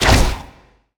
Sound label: Crossbow Normal Cast; bullet